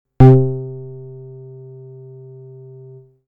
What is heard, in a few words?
Basic Pulse, synthlead